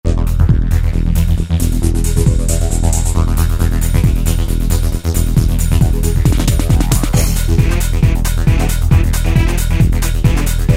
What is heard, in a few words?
Tribal, Yamaha DJX, Styles